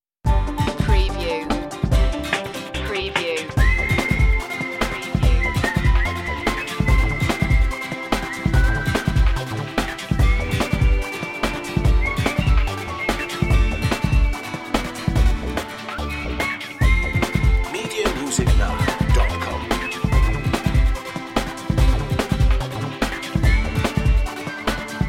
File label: playful march